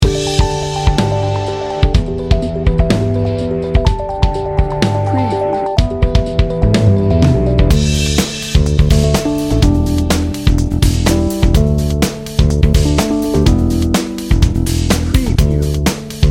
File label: energy field bed